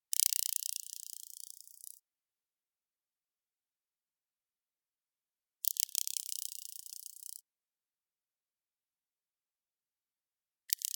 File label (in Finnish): palosirkka